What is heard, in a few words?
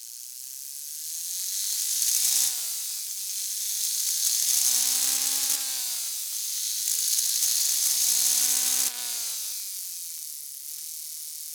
knock; evo dyno tuning results